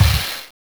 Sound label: Crash Cymbal; Genesis